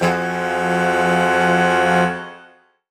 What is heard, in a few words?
Horn Swell Alt Fmajminb6; undercover samples